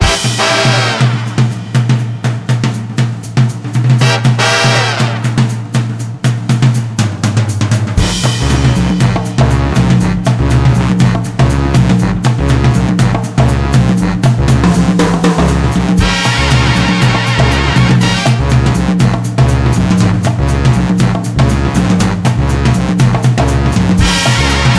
Vintage Horns; Big Fish

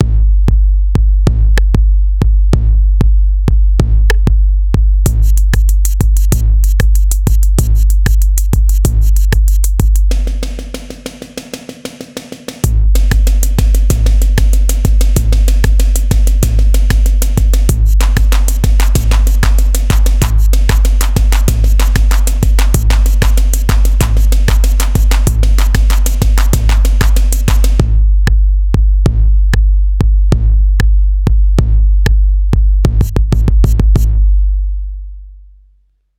Electro Acoustic 808 Snare verb; Soniccouture

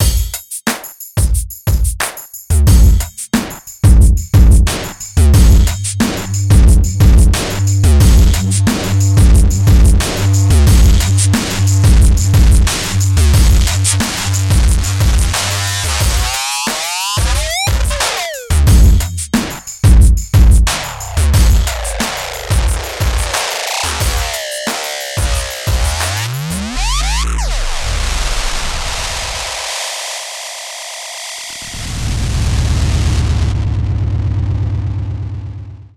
Distortion; MASCHINE STUDIO

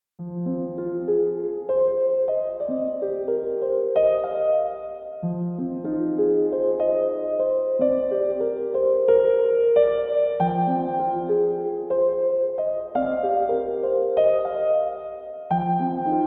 piano v; arturia

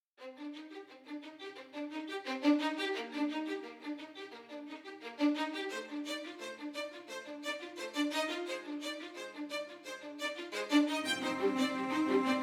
Strings; Soundiron Hyperion Strings Elements

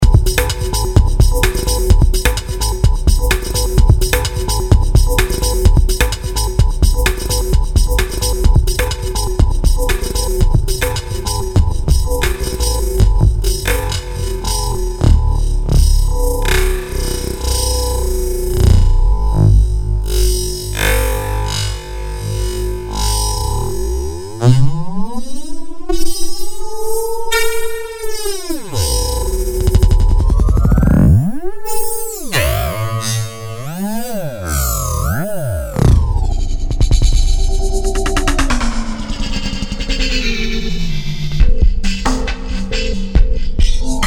FX Transpose Stretch; TRAKTOR PRO 2

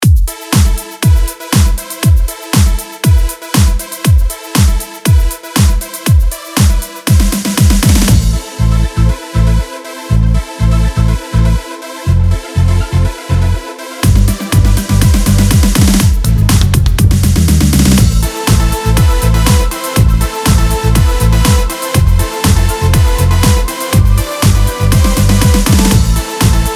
Grooves EDM

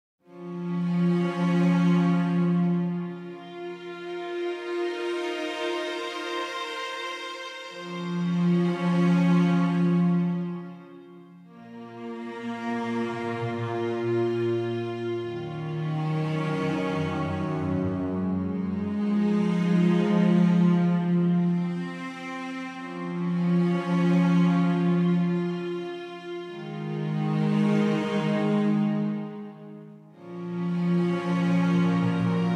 Hyperion Strings Elements; Soundiron; KontaktPlayer

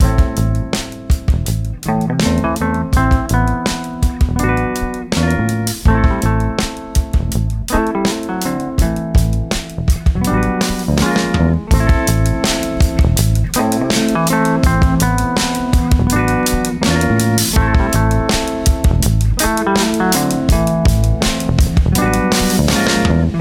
FET Compressor; Softube

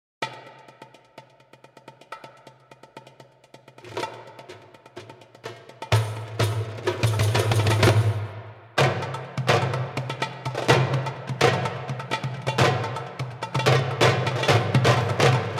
Darbuka; Darbuka X3M; Strezov

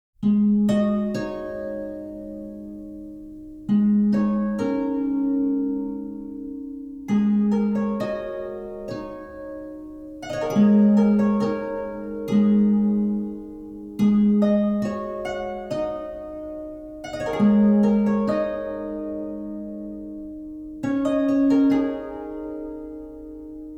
World Strings Guzheng Water; Evolution Series

